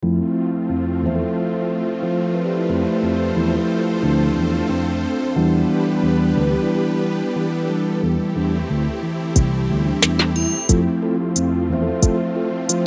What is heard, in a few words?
instrumental; gyarfas endre